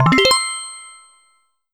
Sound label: winning notification